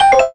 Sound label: Hit